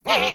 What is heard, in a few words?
Banshee Attack Vocals